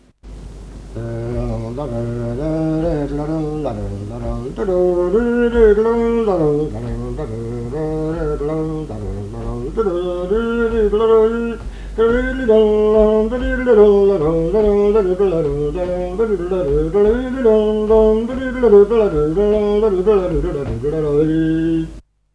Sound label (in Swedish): Polska; Polska efter farfar Gammel Ol Zakrisa